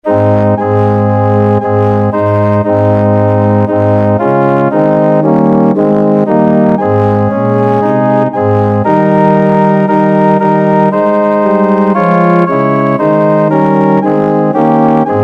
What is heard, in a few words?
organ